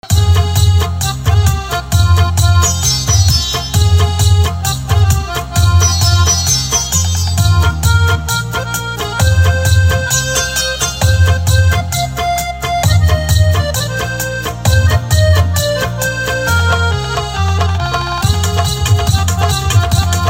Accordion Instrumental Ringtone; punjabi instrumental ringtone

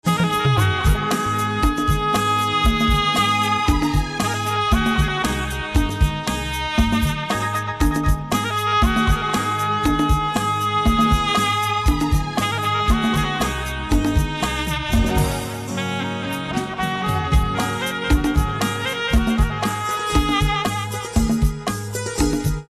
Saxophone Instrumental Ringtone

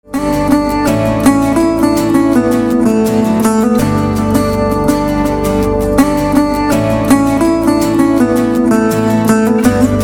Music Ringtone

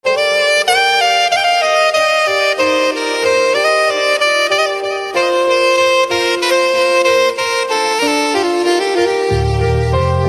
Saxophone Instrumental Ringtone

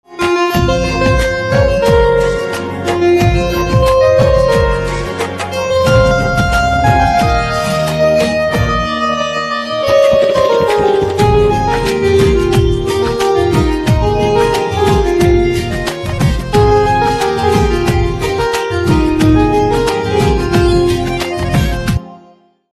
Piano Instrumental Ringtone

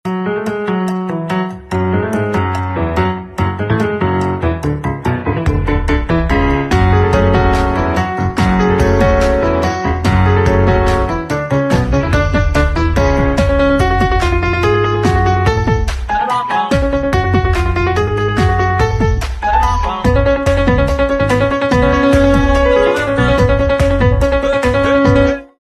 Piano Instrumental Ringtone

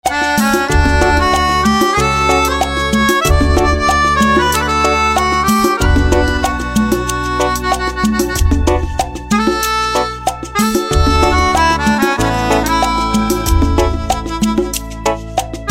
Harmonica Instrumental Ringtone

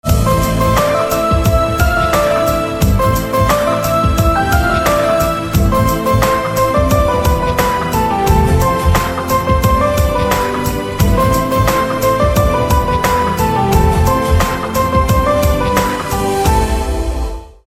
Piano Instrumental Ringtone

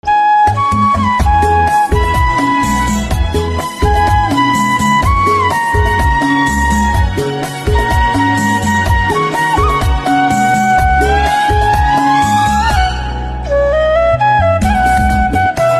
Flute Instrumental Ringtone

